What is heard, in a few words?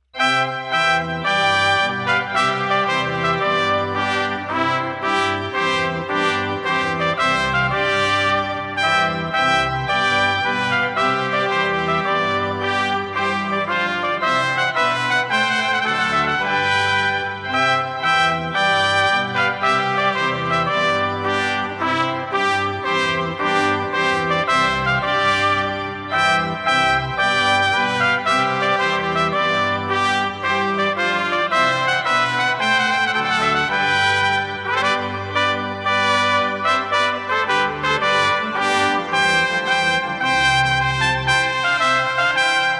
trumpet tune organ